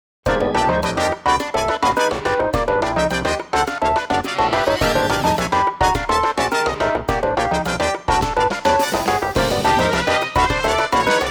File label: Funk Son Cubano